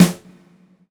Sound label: hot snare drum f sharp key